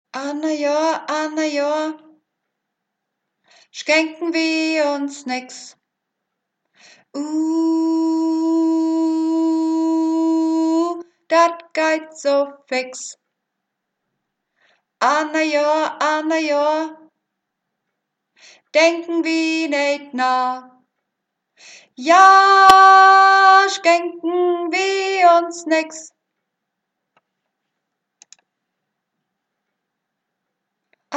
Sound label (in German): Swing Version Alt